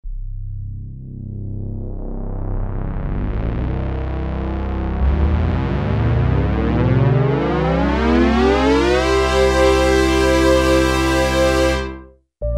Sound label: MUSHROOM, Kawai SX-240